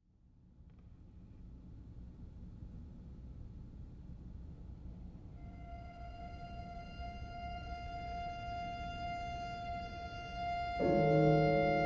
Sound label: untitled work for violin and piano